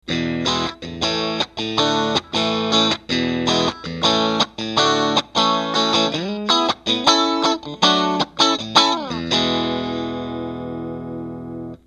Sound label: cable